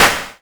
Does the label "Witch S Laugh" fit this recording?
No